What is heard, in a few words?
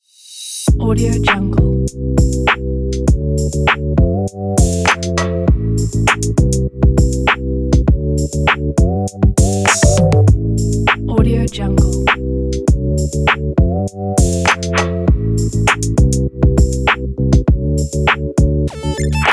abstract fashion hiphop; mark music